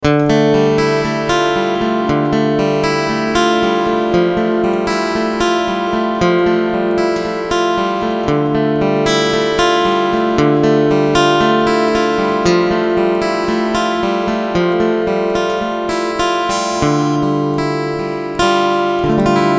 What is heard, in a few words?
Preset Church